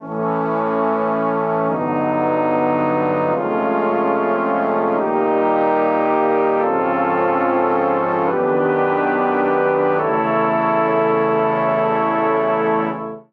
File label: Progression I-bVII-bVI-bV-III-II-I A Major 72 bpm, Brass Quartet; Newton's Third Law; Ableton; Brass Quartet by Spitfire Audio